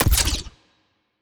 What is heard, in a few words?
evasion debuff projectile, weapons; Stellaris; biogenesis